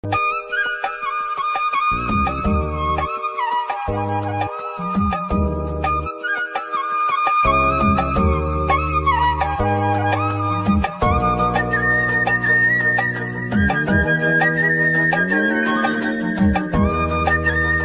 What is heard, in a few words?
love bgm